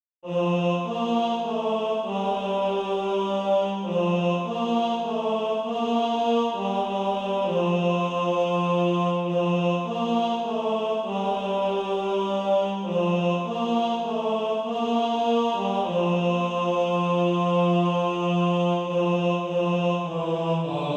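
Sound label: bass, Practise Music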